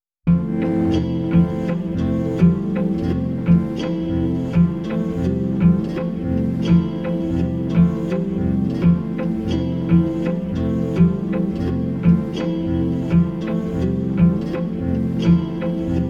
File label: Instrumental